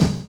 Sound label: OLD SNARE; Univers Sons